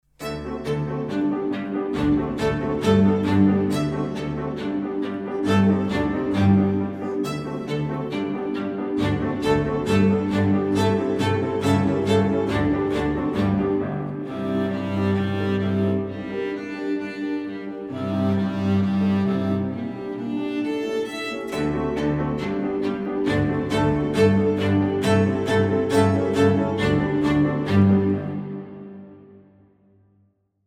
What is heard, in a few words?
Open String Czardas